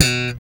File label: 6 STR FUNK; Spectrasonics; Bass Legends